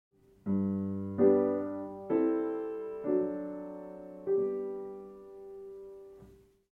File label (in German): bass oktav